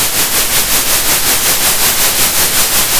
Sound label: center, Sound of a train